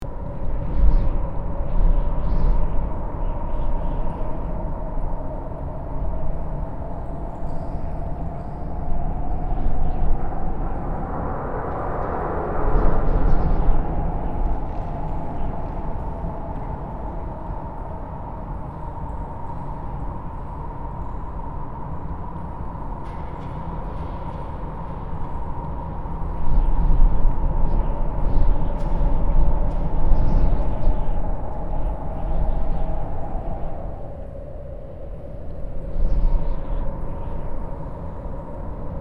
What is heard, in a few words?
Ancient ruins desert ambience loop; nature